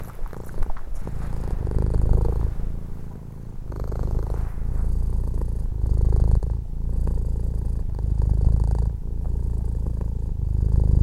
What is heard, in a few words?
puff purr